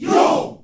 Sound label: GROUP YO, SHOUT; Spectrasonics; Heart of Africa 1